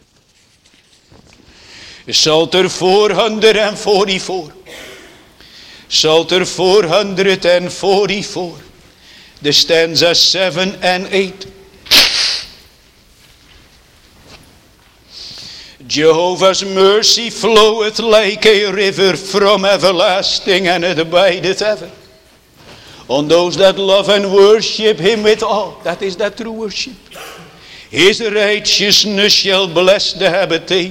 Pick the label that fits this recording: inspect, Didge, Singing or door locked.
Singing